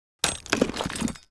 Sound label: Mould Chisel